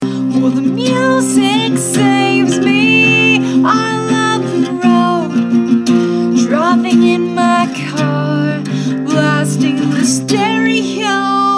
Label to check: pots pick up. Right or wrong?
Wrong